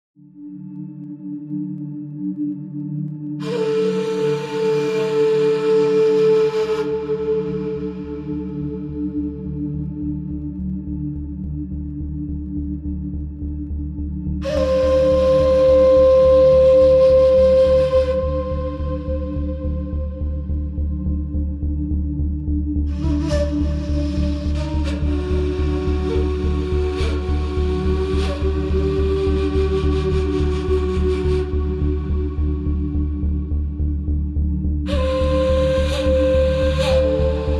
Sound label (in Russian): arabskaia fonovaia muzyka bez slov